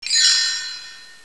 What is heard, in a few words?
Spiral Player; Space-odyssey